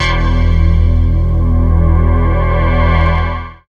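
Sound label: SYN, Roland, synth digital; Sound & Vision - Gigapack I CD 2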